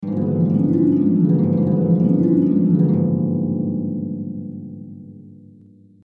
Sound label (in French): Harpe